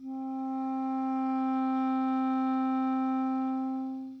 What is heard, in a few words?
Clarinet C4